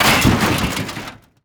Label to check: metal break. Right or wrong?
Right